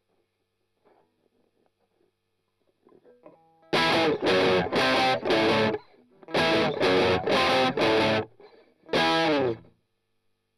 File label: Strum Octav